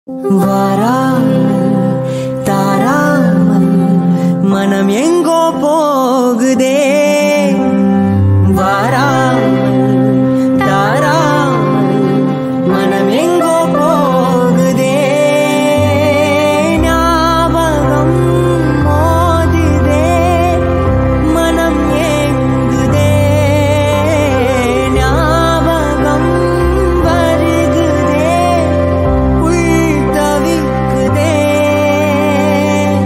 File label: Malayalam Song Ringtone; varshangalkku shesham